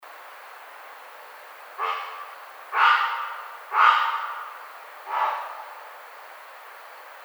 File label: Great Blue Heron